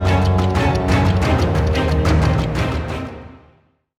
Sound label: Stinger; Zilliax the Customizable